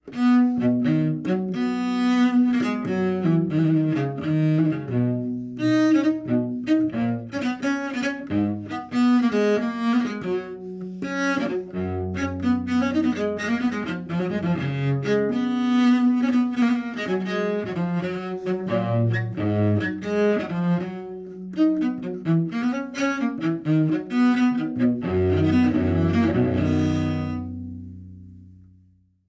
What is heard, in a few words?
Contrabass; Assassin's Creed Syndicate